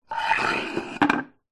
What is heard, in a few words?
household swimming pool skimmer cover stone tile slab lift remove